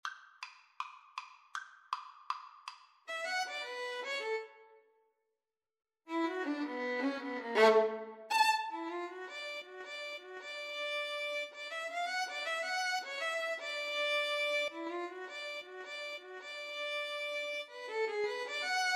the entertainer, cello duet

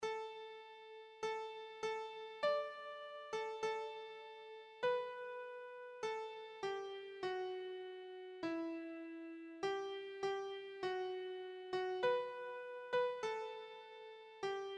lord of hosts, keyboard

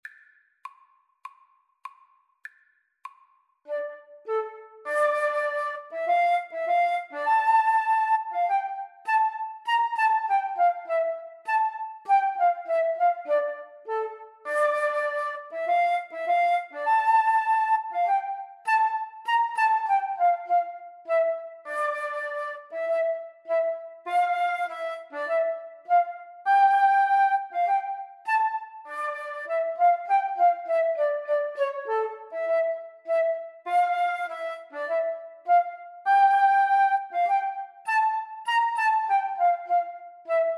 Flute duet

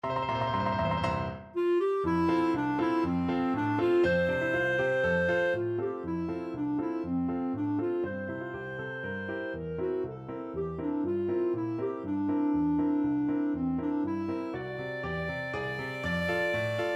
the merry widow finale, clarinet; lehar

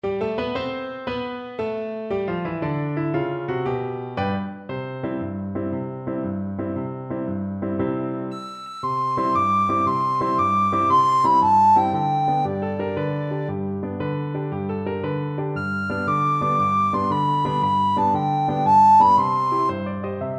luna mezzo mare, recorder

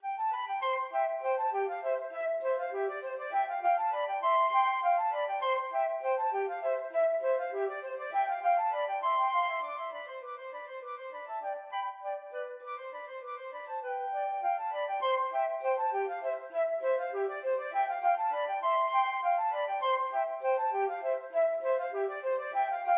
seraglio duet FL, flute duet

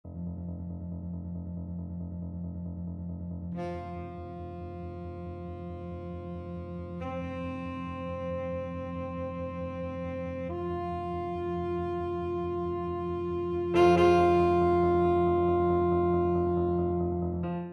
tenor saxophone

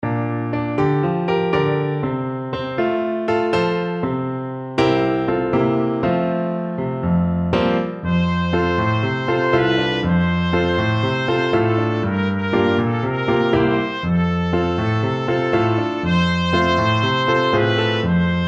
trumpet duet

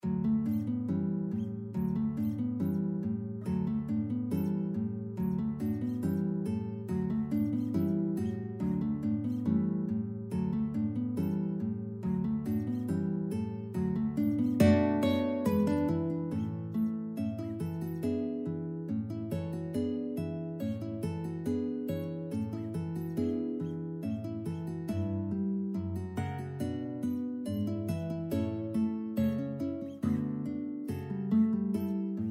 faure berceuse, guitar trio

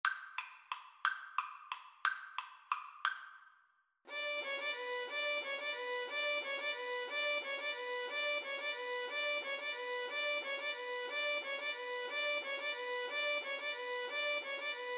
Violin Viola Duet; carol of the bells

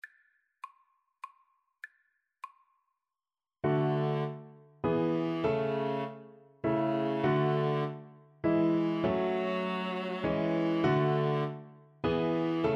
VLN VLA, Violin Viola Duet; holst jupiter

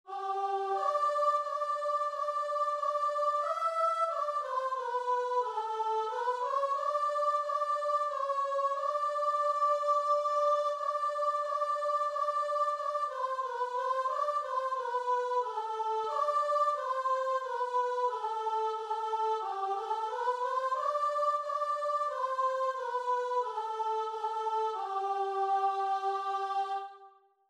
let all together, guitar and vocal

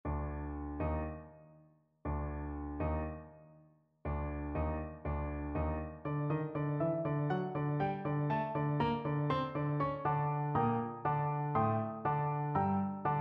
dvorak new, tenor saxophone

